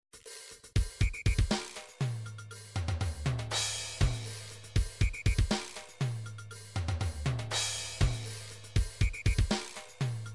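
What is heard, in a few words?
drums pattern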